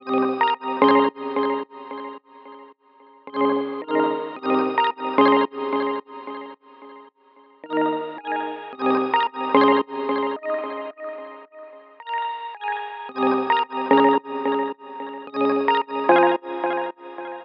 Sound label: songstarter 110 Ab, Pop; Slate; SD PASADENA Pop Sample Pack